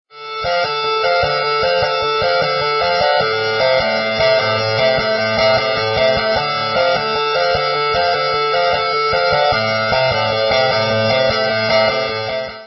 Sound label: Cool Guitar Tone